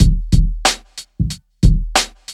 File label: Loop